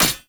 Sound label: Short Sword Medium Impact Armour